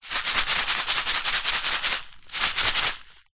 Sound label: food small packet of cous cous shake; zapsplat